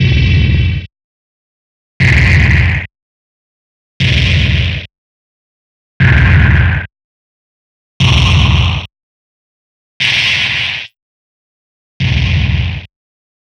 Alesis Performance Pad Kicks

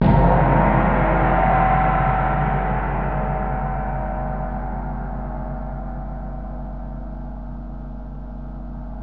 GONG; Roland LA; CYMBALS TAMS

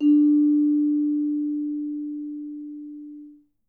D4, Percussion & Harp; Miroslav Vitous

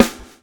swing

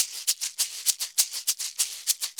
Maracas 100 bpm; Best Service; Festa Latina VOL-1

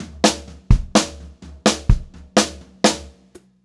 126 TOM, Pop-Rock Drumloops; USB Soundscan vol.37; AKAI

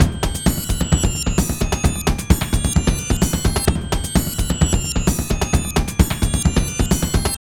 Drum Loops; Big Fish Audio; Prosonus 500 Drum Loops

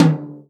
tom, Real Drum Kit, jungle kit

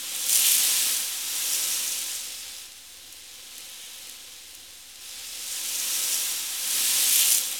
rain tubes

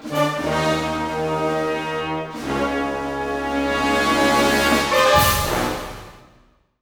FULL ORC, Symphonic Adventures; East West

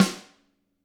GRETSCH SNRR, Drums

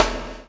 CHAIN; Spectrasonics - Backbeat

